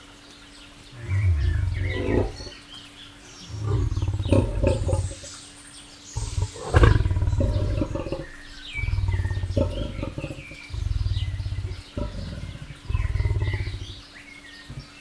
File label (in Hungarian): szelíd hörgés, oroszlán; jaszberenyi zoo 2015